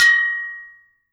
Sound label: steel